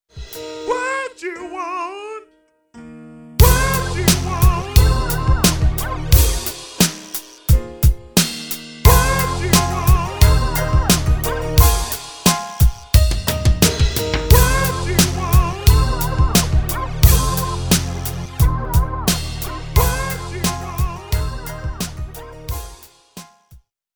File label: jazz